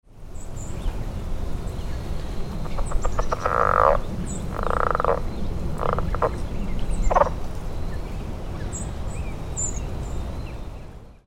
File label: Southern Leopard Frog; Choptank MD